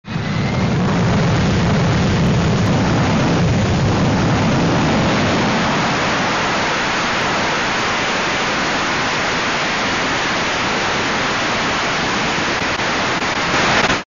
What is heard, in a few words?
Sfx Rocket; nyan cat lost in space